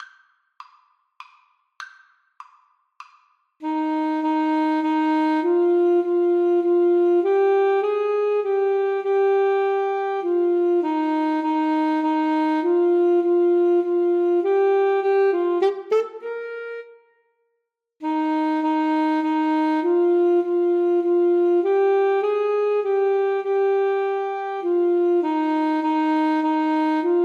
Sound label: Alto Saxophone Duet